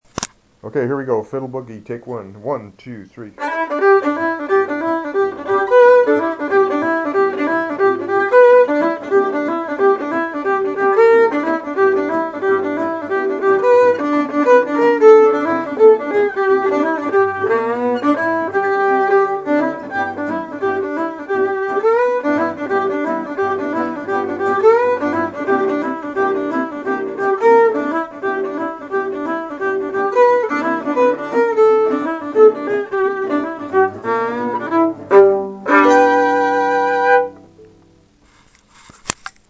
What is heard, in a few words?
Fiddle Boogie